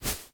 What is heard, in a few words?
Footstep Beanbag